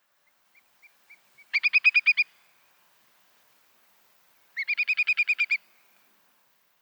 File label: numpha call passage; hyllekrog dk; a.celmins